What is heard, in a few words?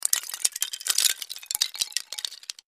Wood Shims, debris